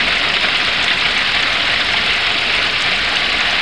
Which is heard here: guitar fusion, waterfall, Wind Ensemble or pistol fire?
waterfall